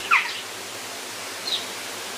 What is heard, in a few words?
Birds Various; outdoor